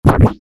environment water splash

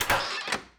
Bathhouse Door Open

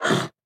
Attack

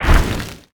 Footstep Lava Monster; gamerip; Mars Sortie

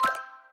Block Match; In Block Match02

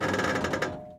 Alchemist Creak

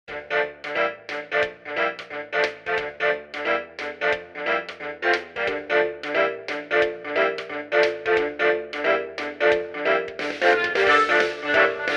Radio Swing; Dusk City

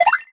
Fishing Get Failed; MiniGames

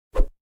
swing

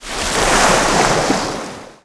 Boat Splash

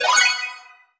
Fishing Get Heavy; MiniGames

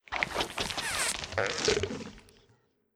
Mushroom Idle

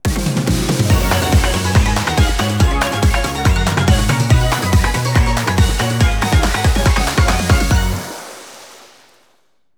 Jingle Title; maimai dx splash plus arcade gamerip 2021

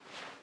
Sfx Baseball

